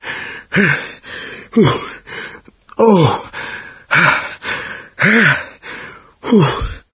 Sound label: heavy breathing; voice; source engine; left 4 dead 2; survivor